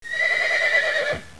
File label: HORSE; 特酷声音素材库; 动物; 家畜; 马